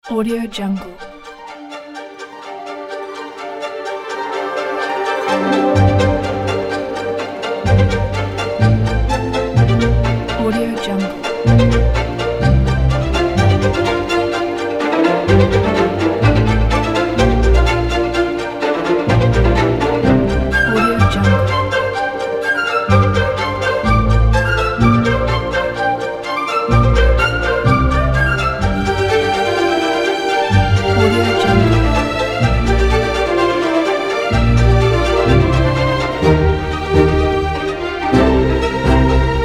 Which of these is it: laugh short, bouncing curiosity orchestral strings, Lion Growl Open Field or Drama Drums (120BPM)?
bouncing curiosity orchestral strings